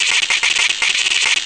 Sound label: roach feet